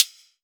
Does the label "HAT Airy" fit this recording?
Yes